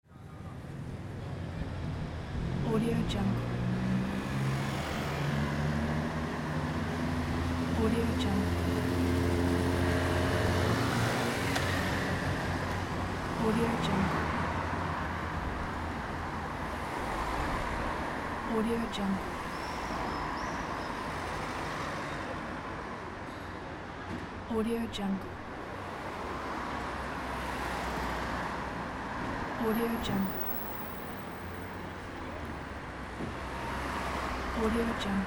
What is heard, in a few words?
city traffic